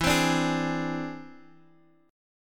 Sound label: E Augmented 7th E down; E+7 strummed; JGuitar